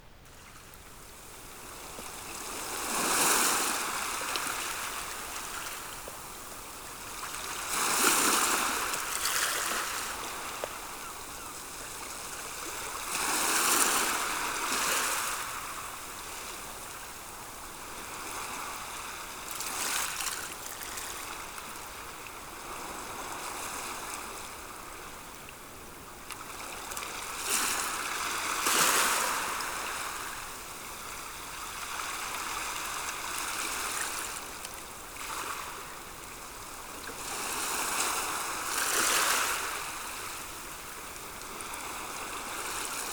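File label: sound of sea waves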